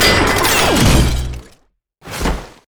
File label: emote death; Vi; racer